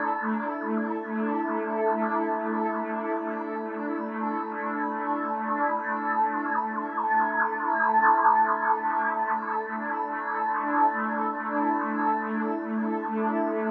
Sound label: SPACE PAD 140 A; SpaceSounds